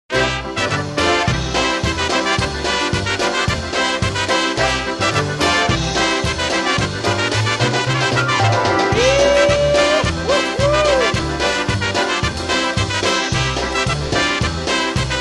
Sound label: Polka